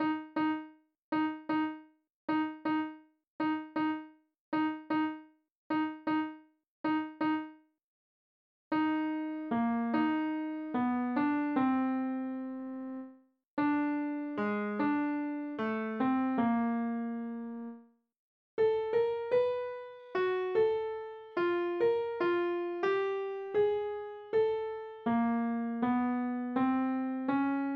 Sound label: alto